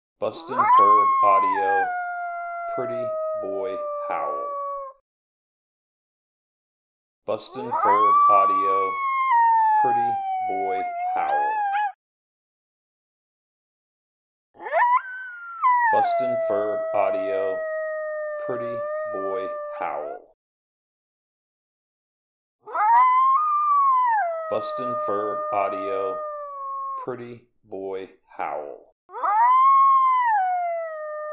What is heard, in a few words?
Howl; Pretty Boy